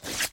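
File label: short fast wave sound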